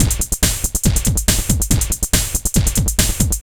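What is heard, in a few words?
drum loops, right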